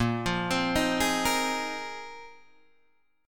Bb 7th Suspended 2nd Suspended 4th Bb, Guitar Open D